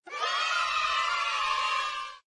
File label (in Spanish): niños alabando